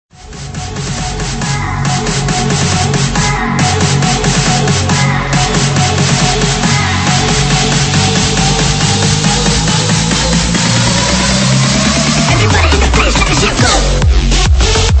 Hard-Psy

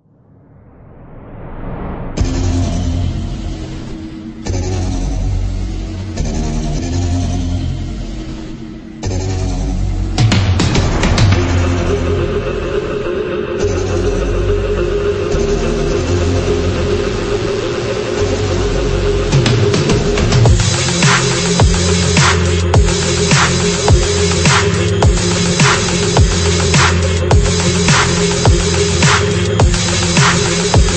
DarkSynth and Electro; OST Audio; Subformat Vol 2

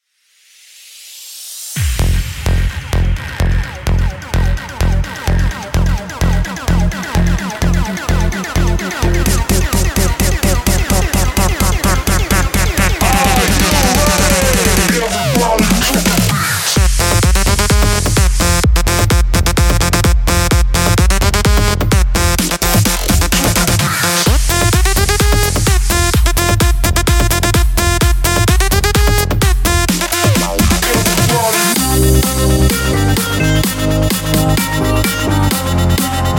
Festival Anthems; Producer Loops

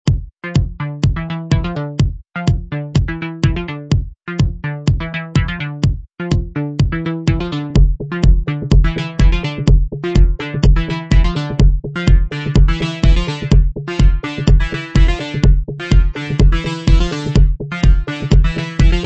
Dark Tech House; About Noise

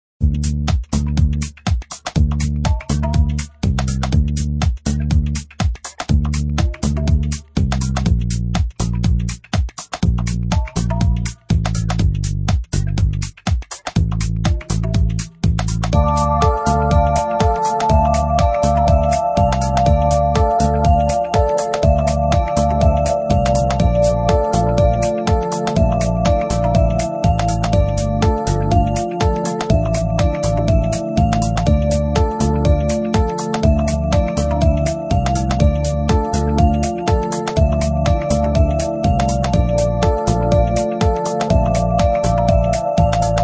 Deep House; Digital Dimension Audio Deep House Elements Vol.3

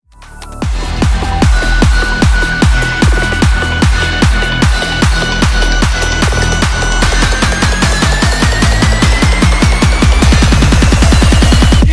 Trap; Cymatics Trap City

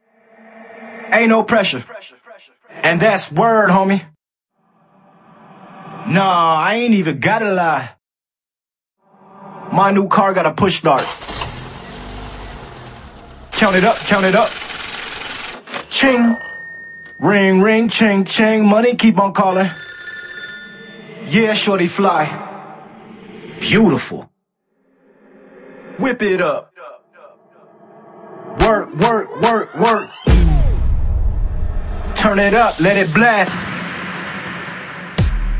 Vocals; Noiiz J-Kits Era

